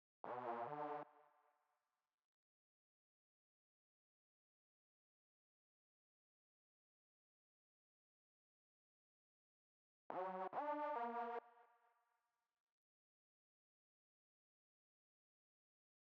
Synth; ODGPROD

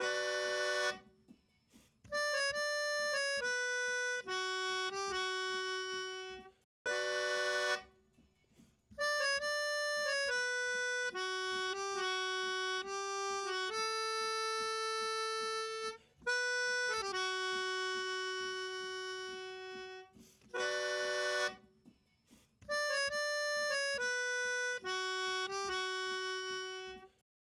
Melodica, dub; ODGPROD

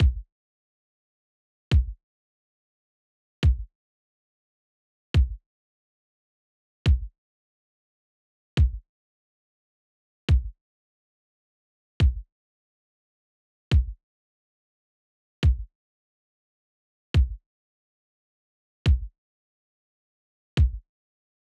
Kick, dub; ODGPROD

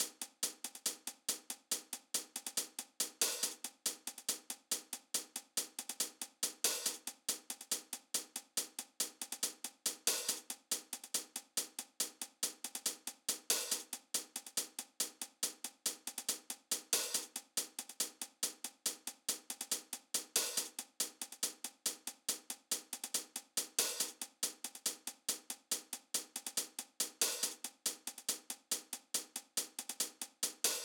Hihat, Dub; ODGPROD

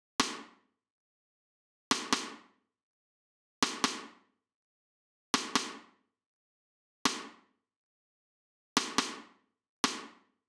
Rimshot; ODGPROD

